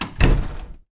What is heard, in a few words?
door close